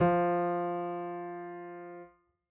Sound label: piano f; Golden Crayon Sounds